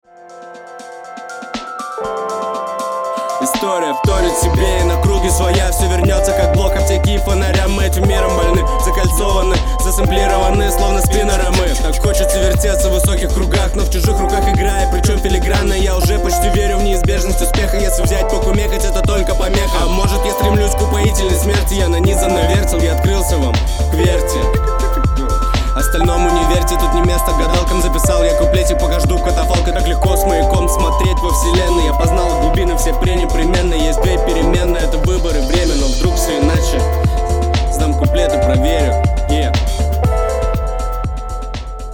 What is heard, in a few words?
beat; coin